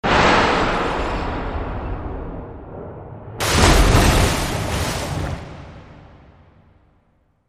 Splashdown

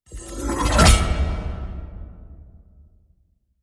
Cold Skin Knife Boom

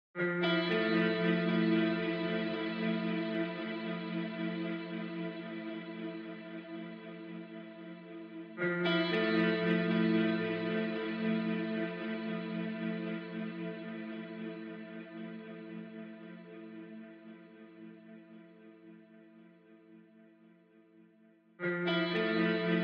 Spies Guitars Only

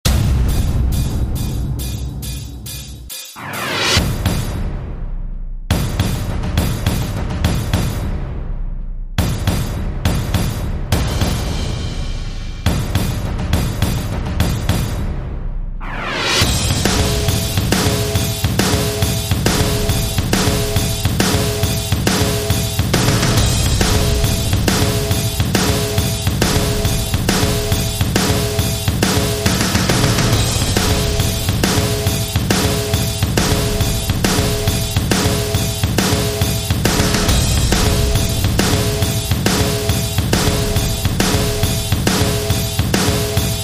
Drums Only

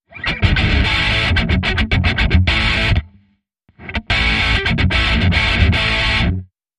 Breaker Action A Guitar Only

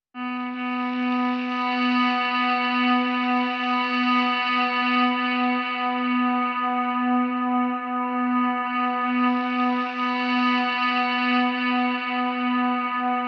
Clarinets Only

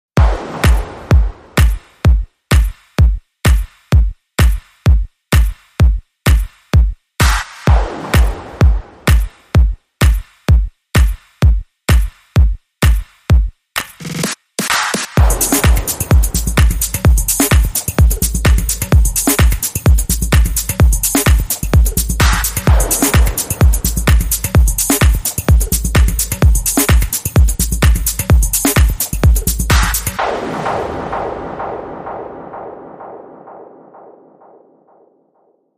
Beat

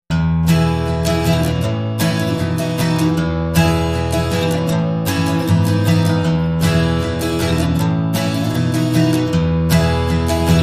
Radiant Spirit, Acoustic Guitar

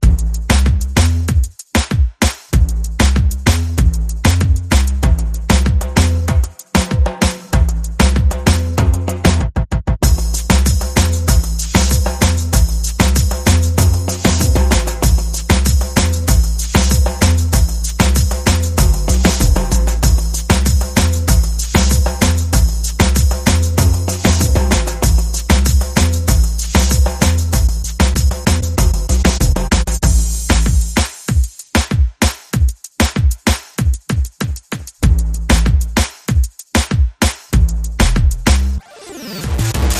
Bass Perc and FX Only

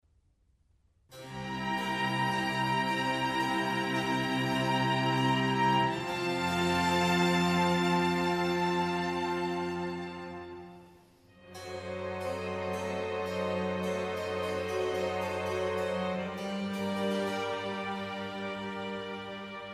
Concerto for Strings in B flat major; RV164; Antonio Vivaldi

